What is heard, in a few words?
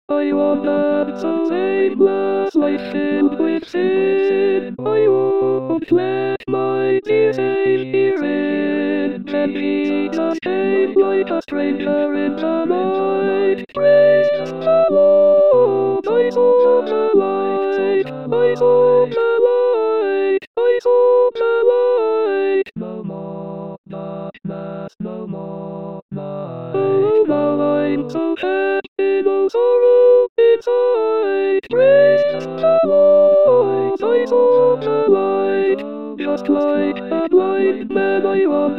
Sopranes